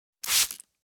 Spray bottle squirt fluid glass cleaner cleaning product